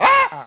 bark, creatures; kaadu; Star Wars Obi-Wan; XBOX

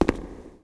footstep subway

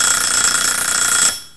Alarm Bell; Finding Nemo; XBOX

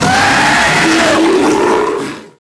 Roar, Dino; Alberto

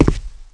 Walk Concrete; Player; Footsteps

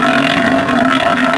roulette running; Max Payne

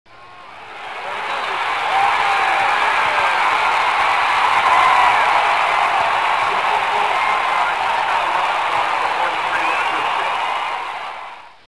wind

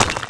bouncy brick hit; bouncy objects; Tony Hawk's Project 8; XBOX

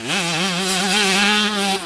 power, Engine

